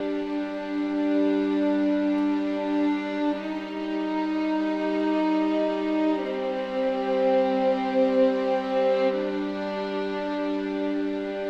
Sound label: sounds music; Halo Combat Evolved; Xbox